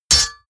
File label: sword hit metal